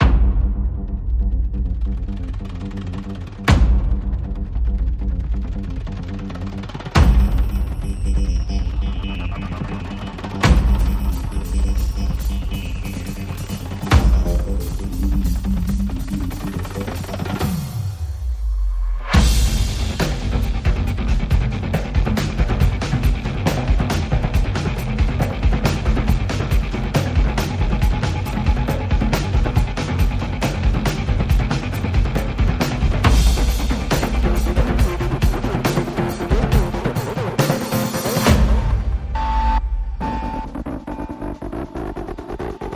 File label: Drum Driving, No Pads